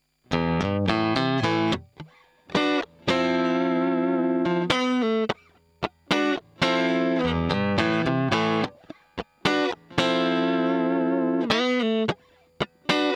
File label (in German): DI Aufnahme Boss CS 3 DI Signal